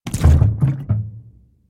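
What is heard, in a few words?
barrel sounds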